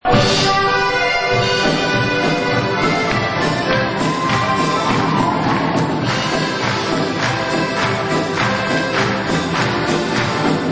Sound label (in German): Trompete